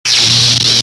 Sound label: saber block; weapons